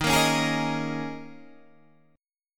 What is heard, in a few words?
D sharp 7th Suspended 4th; D#7sus4; strummed